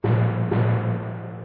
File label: Tymp